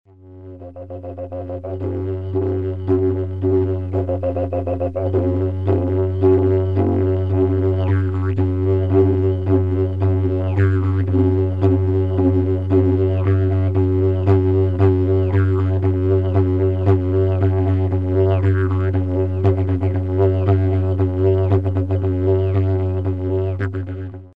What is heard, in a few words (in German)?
Zeitung F#, Didge; Die Zeitung von Mittwoch war das Material für dieses Didge